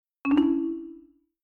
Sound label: New Message